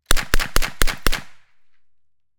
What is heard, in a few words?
uzi 9mm 1m right clean five shots; Sonniss GDC